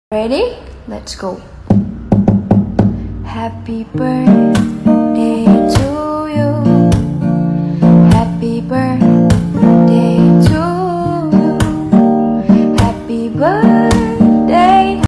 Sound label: music happy birthday tik tok